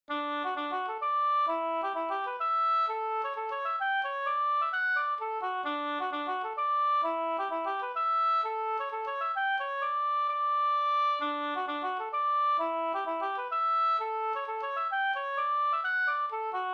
hambopolska; Birgers hambo Up

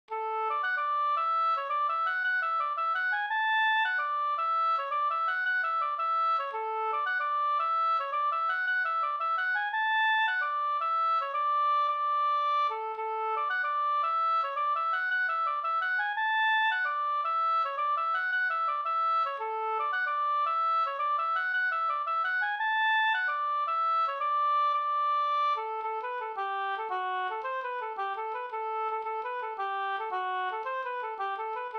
polska

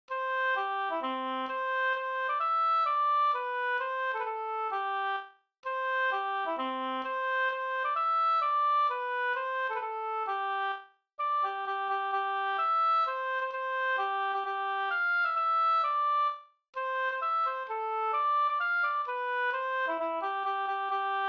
polska

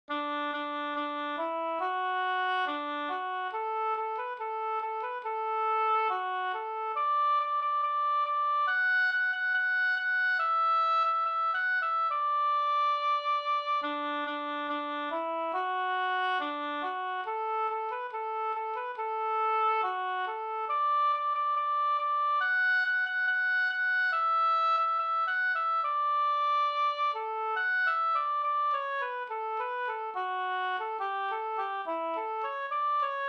brudmarsch; fr Hulared; e AJ Pettersson

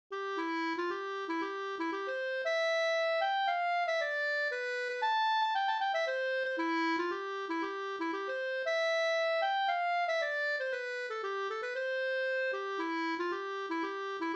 polska Bodapolska

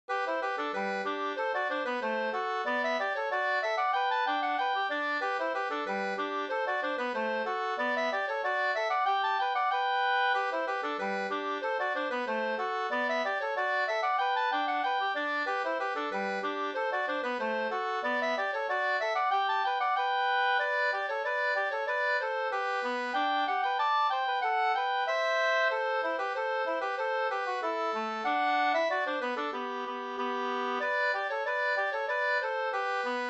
polka polkett

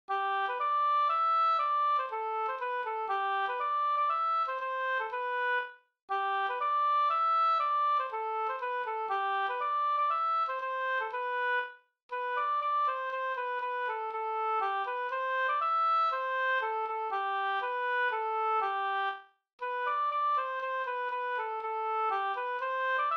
polska Slangdans

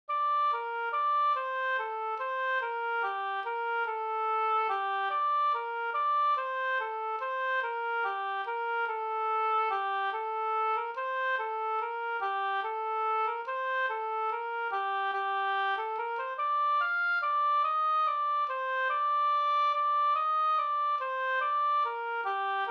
langdans; fr Sd Lilla vallpiga Rosenberg

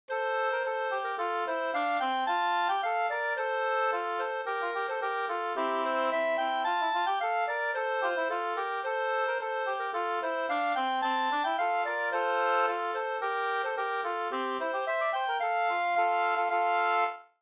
ganglat

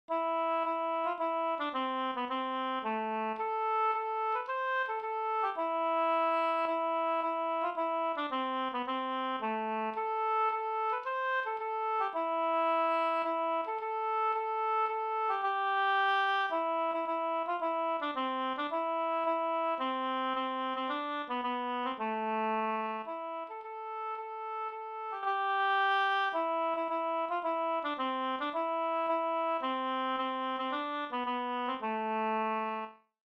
polska a moll; Ardalapolskan Nog minns jag Sd

